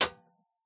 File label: Switch Circuit Breaker Off